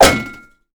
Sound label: Bullet Impact Metal